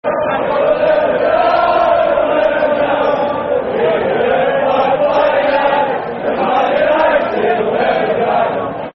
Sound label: Football Chant (Soccer Song), Man United; The Red Flag